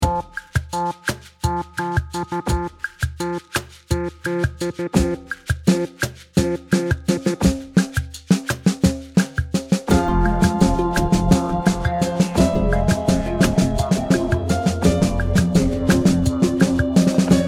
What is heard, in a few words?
Something Odd with snare